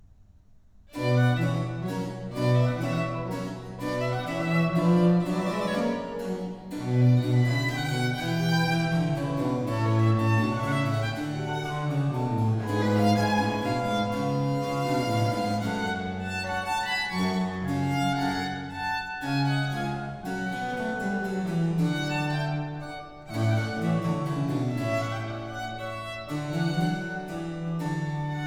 Concerto in C minor for violin, organ and strings, Largo; Antonio Lucio Vivaldi; Organ Concertos; RV766